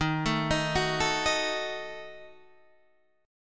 D sharp Diminished D sharp, Guitar, Open D; 13,12,13,12,12,13; chordshape

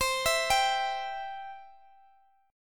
C Major, Guitar Open D

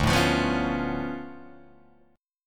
D Minor Major 13th, down, Guitar, DADGAD

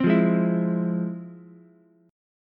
F Minor Major 7th, Ukulele, chord; FmM7 strummed; JGuitar

